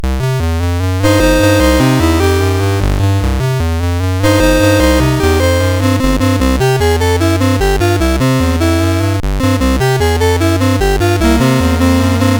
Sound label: Bugle Boy, MOS6581R4